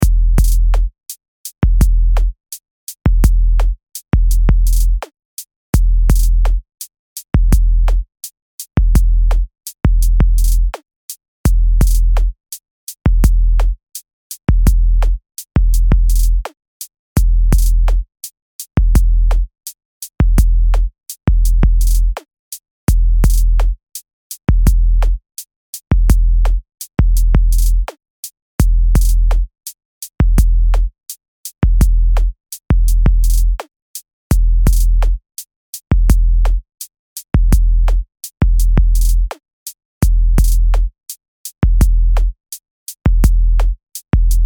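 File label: beat 84BPM, Loops und Beats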